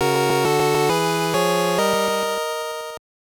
Jingle; card puzzle collection ginga 1990 gb

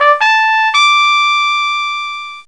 FLAG WAVE

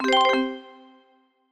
Jingles Extend

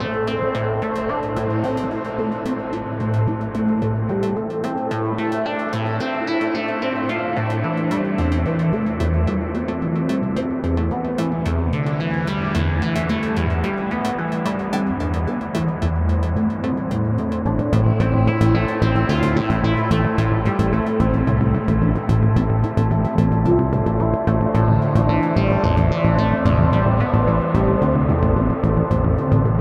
Escape Failed; escape academy

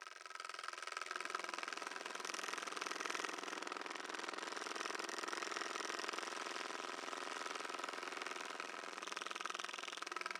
Flying Shrimp; We Are the Dwarves

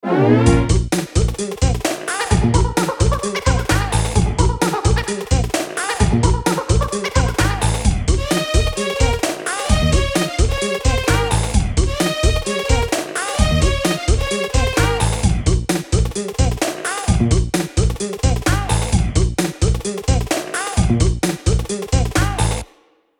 Boss Bossanova clear; donkey kong country 2 fan remastered; diddy

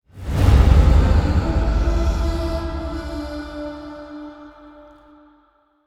Gameplay Ui; prince of persia the lost crown gamerip 2024

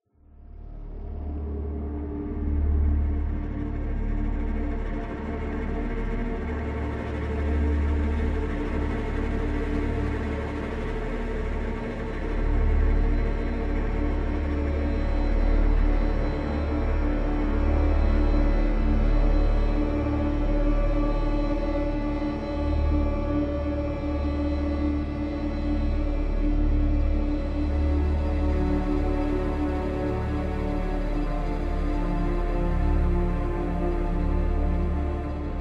Tension Strings

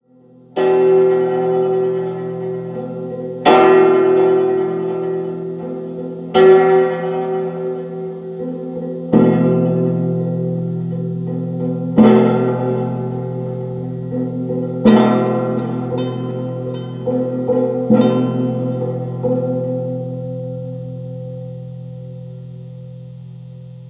Plucked Guitar Explore Mood; gamerip; the last of us

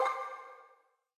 Gemscount Loop-End

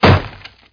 Zombie Dig; CastleMiner Z; Xbox 360

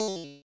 Item Chime; Carried Item Drop

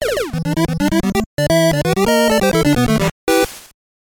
Game set; bases loaded 4; nes

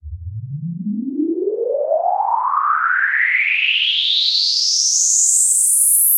Riser

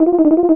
text talk

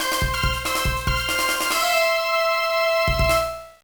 Jingle; American Bass Challenge; Game Boy Advance

